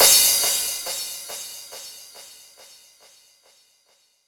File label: Crash, 128 BPM; Vocal-Hook Kits; Rap